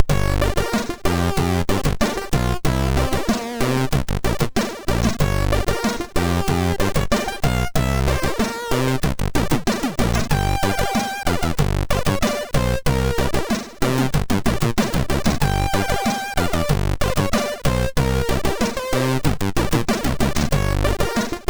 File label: Short Intro, MOS6581R3